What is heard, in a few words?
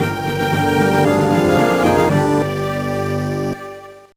Defeat Jingle; Legend of Spyro The Eternal Night 2007 gba